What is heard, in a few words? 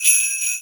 Sleigh Bells Single